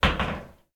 billiards pool sports sport ball fall pocket plastic hit impact; fvtt sonniss gdc audio